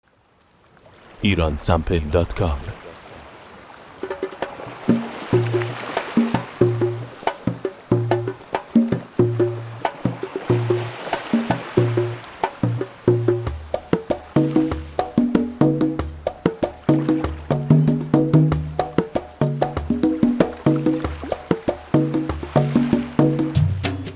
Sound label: Bandari, loops